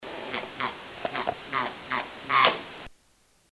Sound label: Rabbit